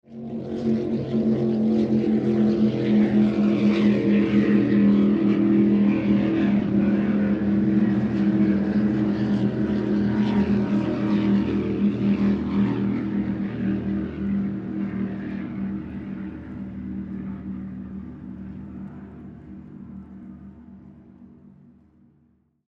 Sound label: Speed Boat Drive By Distant Watercraft; Transportation Watercraft; Boat Sound Effects; Blastwave